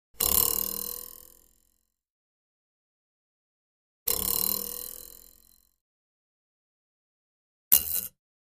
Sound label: Twangs Metal Up Meta; boings / twangs / snaps